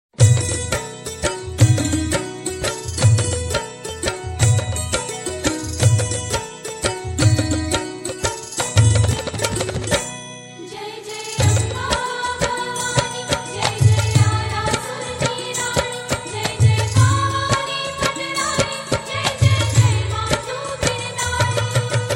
Gujarati Garba Tone

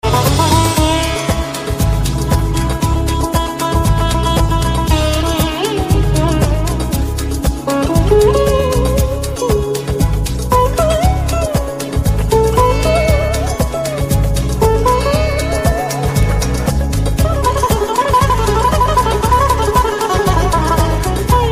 Sitar

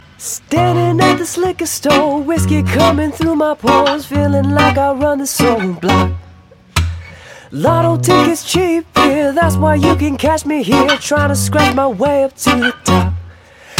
Blues

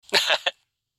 Text tone Cute

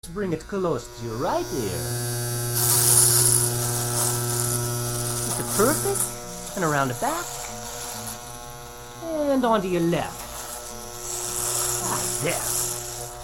Electric Hair Shaver

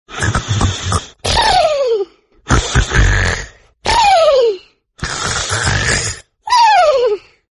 Ring tone

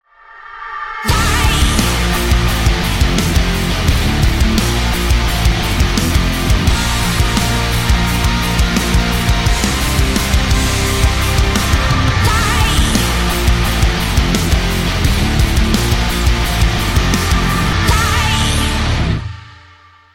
Guitar Intro

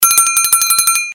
Bell

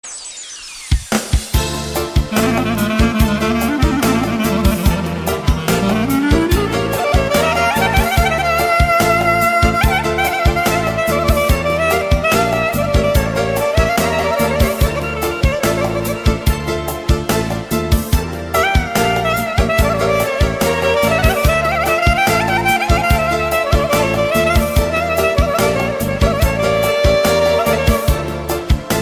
Clarinet; Kavkaz

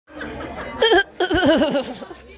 Grunt

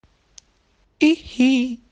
hi-hi

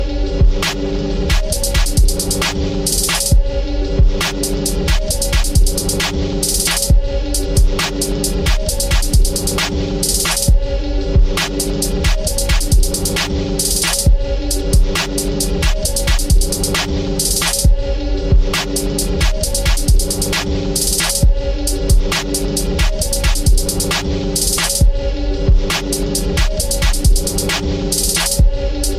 False Beat

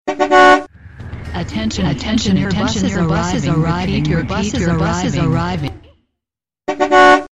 Bus Arrived

